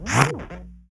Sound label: perc